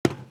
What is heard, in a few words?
Footstep Metal; Footsteps